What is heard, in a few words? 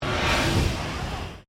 Flame Gas Giant Burst Small, Giant industrial gas flame jet; Flames (Sound Effects:Objects)